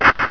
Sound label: ammo; hurrican